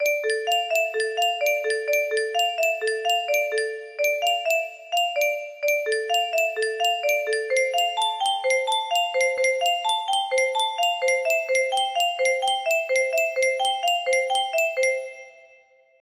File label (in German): spieluhr